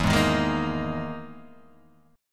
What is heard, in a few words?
E-Major Sharp 11th E, Guitar Half Step Down